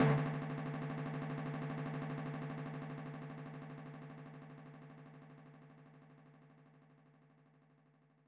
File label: Drumroll; the magical quest 2 the great circus mystery; snes